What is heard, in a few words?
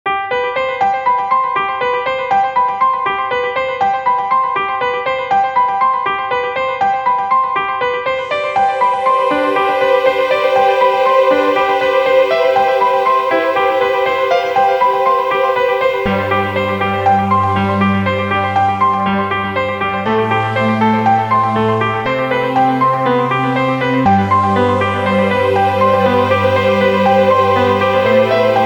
Pure Love Piano Ringtone